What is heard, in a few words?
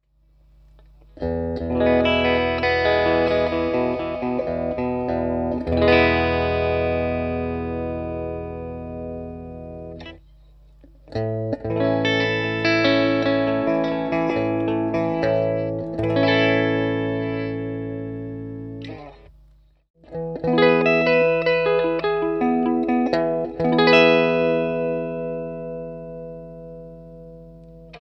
BRIDGE CLEAN